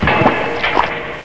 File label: oil pump; My Science Fair